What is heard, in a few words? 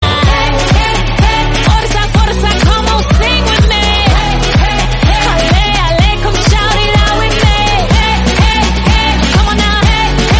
hey hey hey forca forca come on sing with me ole ola, spanish song